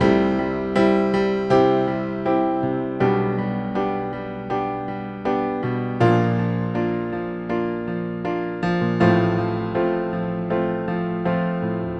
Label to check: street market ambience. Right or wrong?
Wrong